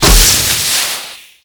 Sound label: steam pipe burst; Explosions